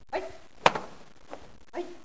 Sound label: karate